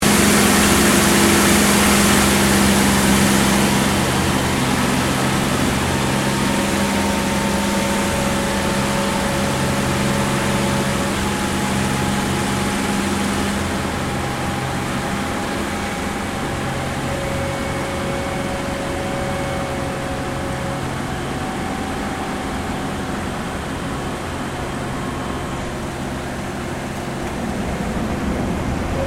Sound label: AIRCRAFT CAC CA-18 MUSTANG MK23 APPROACH LANDING INTERIOR; CA-18 /P-51 Mustang; Airplanes; Vehicles; Aircraft Collection; Twistedtracks